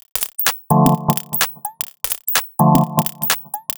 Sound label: 127bpm, House Drumloops